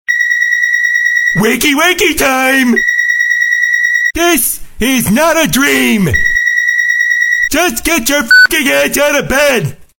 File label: wakey wakey alarm